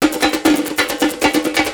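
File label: TIMBALE LOOP, Techno Trance; E-MU Formula 4000 Series Vol. 2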